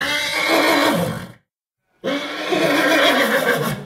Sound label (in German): pferd wiehern